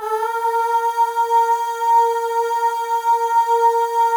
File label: AHS, FEM; Spectrasonics; FEM.1 AHS